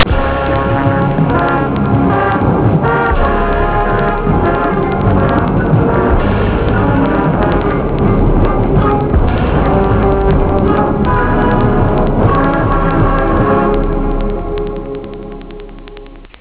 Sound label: Theme Short